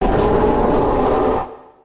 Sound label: cry